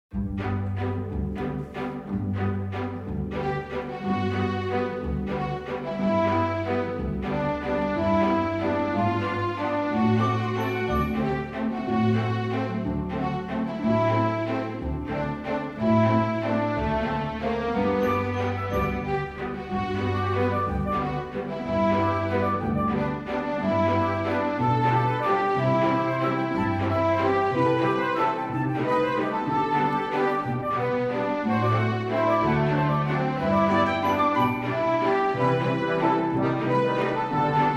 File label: Ballroom Waltz; Mata Hari